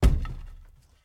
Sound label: reel Stop; lost relics